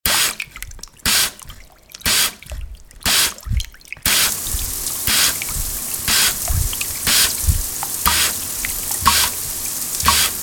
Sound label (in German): beim Wasser